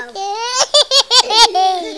Laugh

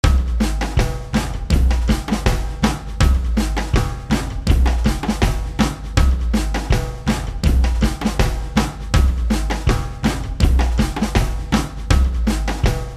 drums

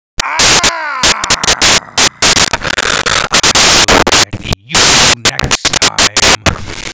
Aaarrrgh

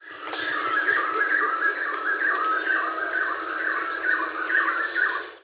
black-brested wood, quail

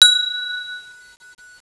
chime

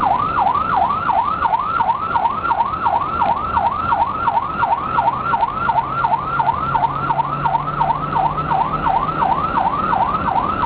siren yelp

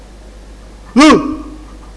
uuu

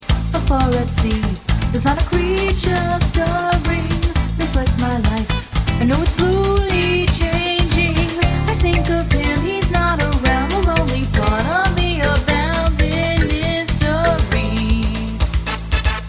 samba sample

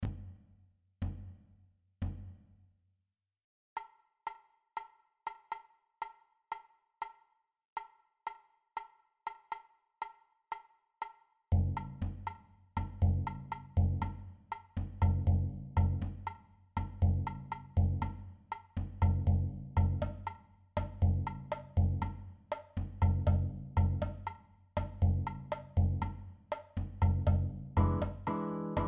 Lamento Espanhol samba

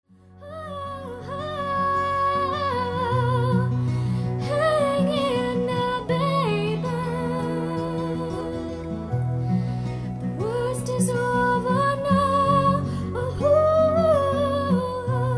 Hang

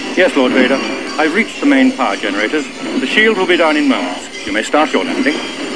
generator; veers

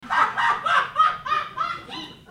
laugh; the wizz

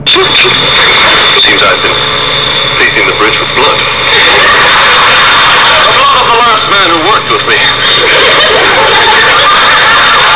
sawing

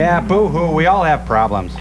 boo hoo

